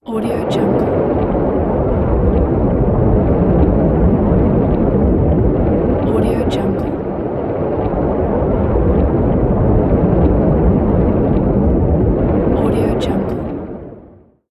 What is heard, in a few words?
underwater